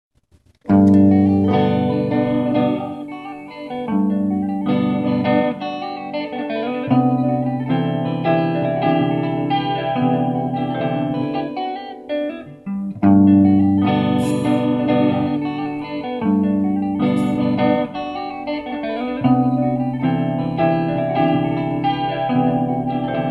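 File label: Playing Guitar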